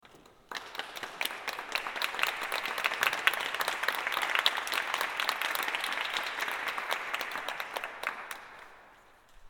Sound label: Applause; Five Mystical Songs Paris, 28th April 2007